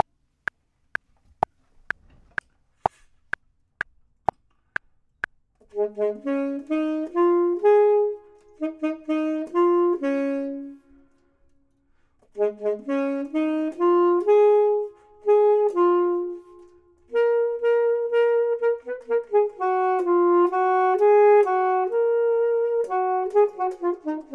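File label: top, Saxophone and Clarinet; voxman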